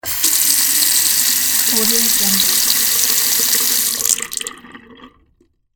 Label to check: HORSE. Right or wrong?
Wrong